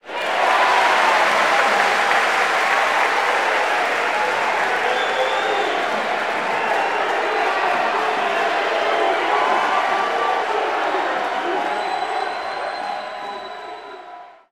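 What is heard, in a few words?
Basketball Crowd Cheer; SFX Kit; Crowds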